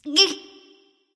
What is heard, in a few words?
lift grunt; smite; awilix